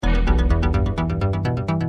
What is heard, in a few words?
ringtone short